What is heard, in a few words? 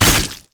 ranged attack; LoL; jayce